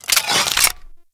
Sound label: Sword And Whip Equip; Warframe